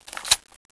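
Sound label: Silencer On, weapons M4A1; COD4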